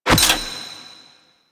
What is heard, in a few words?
Token Insert; DE; Warframe; Greed Token Menu